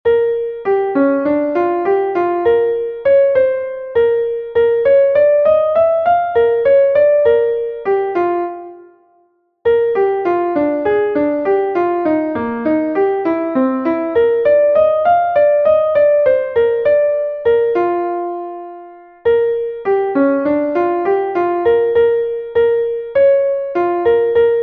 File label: super slow mel only Bb; Buzzr